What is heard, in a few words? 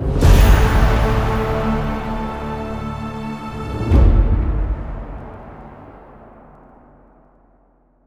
outro Freespin; netent